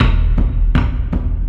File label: level, scavenge, music; Left 4 Dead 2